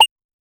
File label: simple 8 bit ui